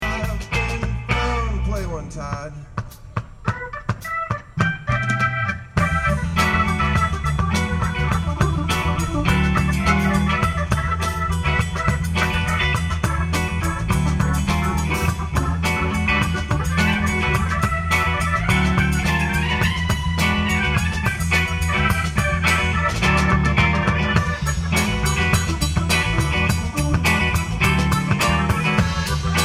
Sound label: haunted solo, Hammond